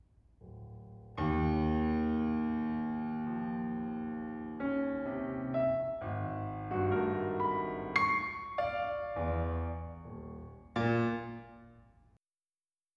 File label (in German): Klavierstück; Klavierstück I Version A Take 1 and Version C Take 2 bar 1 comparsion